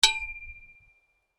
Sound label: Metal impact: light hit on hollow metal pole; Miscellaneous Impact; Stuart Duffield